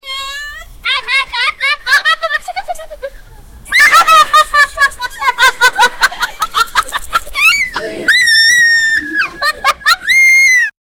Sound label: kids and parents laughing; family